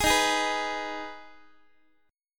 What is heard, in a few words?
D sharp Suspended 4th Sharp 5th, Guitar; strummed; JGuitar